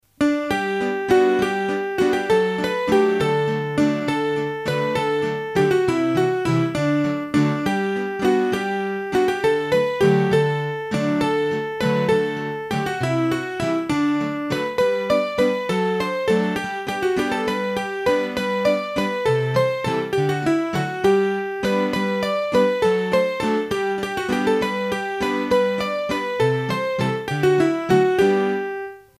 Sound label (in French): La Bourree Valsee d'Issoudun, hurdy gurdy; Massif Central; Bourrees a 3 Temp